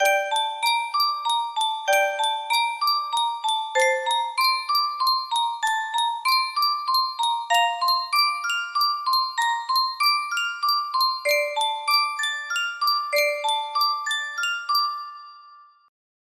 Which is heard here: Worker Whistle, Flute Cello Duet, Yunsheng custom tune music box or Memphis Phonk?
Yunsheng custom tune music box